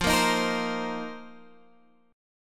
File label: F 7th Suspended 4th F down; strummed